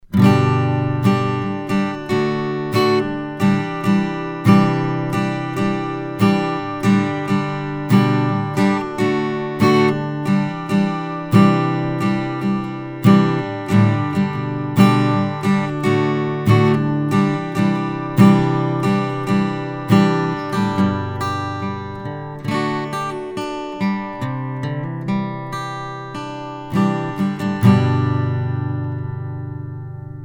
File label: Acoustic Guitar